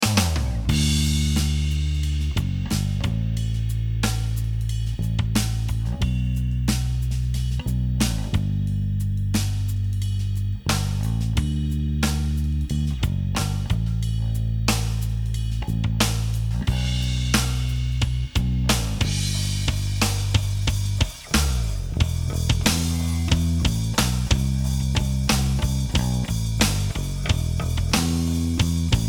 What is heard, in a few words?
Electric Gispy Backing Standard Tuning